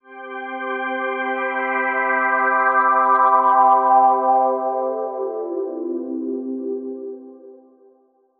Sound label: achievement all; MBHaxe